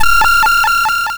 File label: warn